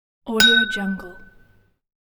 wine glasses

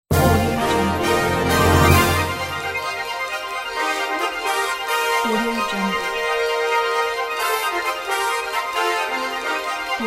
animation movie opener logo sting

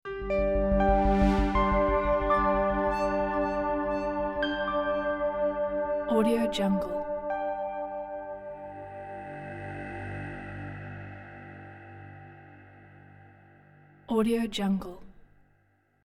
intro logo

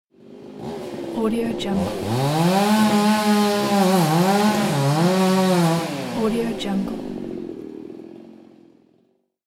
chainsaw

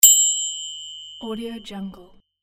ding

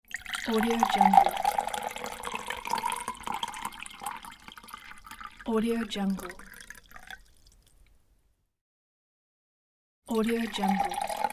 pouring water in glass

